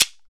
PRC WOLOF, Afro Shakers

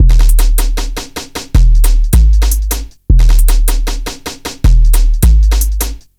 BOMBOM, 155BPM, breakcore

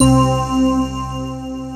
JD990

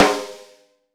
LUDWIG SNARE

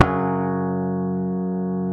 F#, Bass; Sampleheads; Will Lee Bass Library

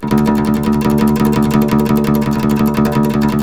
TREMOLO, Turkish Oud

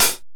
RAP CHH, LOFI HATS

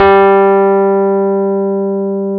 Electric Pianos; Wizoo

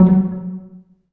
PIZZ, Dance Mega Synth

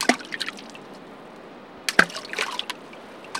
BLOP DROP; Zero-G Ambient 1

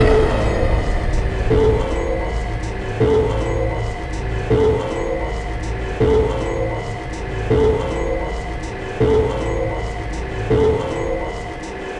rhythm effect, Drum N Bass; Sonic Foundry (Sony Creative Software); Knutrix Drum & Bass

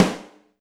Snare, Alesis DM5

